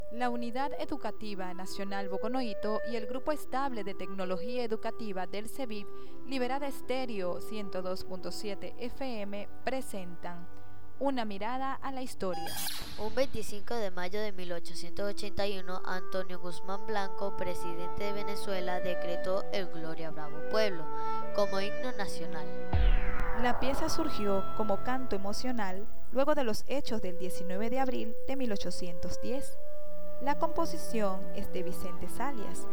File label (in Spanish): Himno Nacional; portuguesa